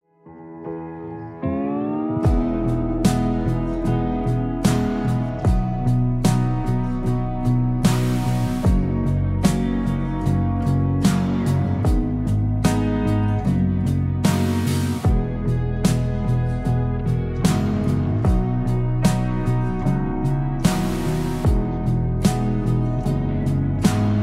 Instrumental

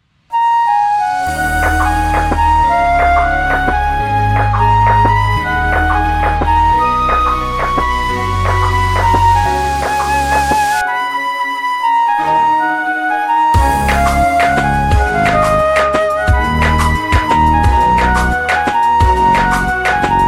flute

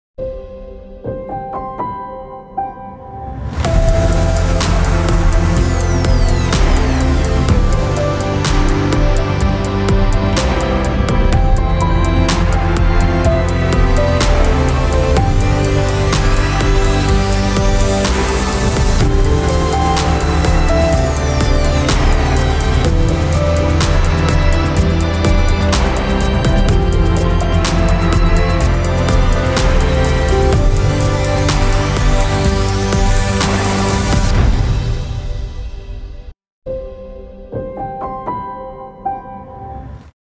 samsung remix

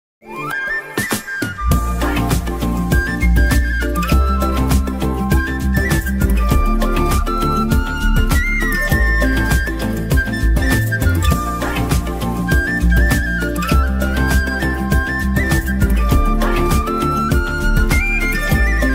Flute

